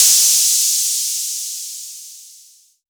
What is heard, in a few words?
drum crash; Convolution of any signal with δ[n] reproduces the original signal